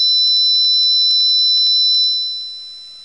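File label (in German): wasserkocher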